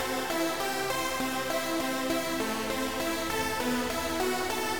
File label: trance background 8bit texture; synth loops